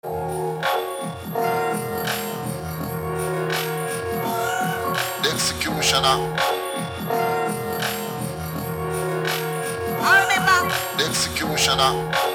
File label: Beat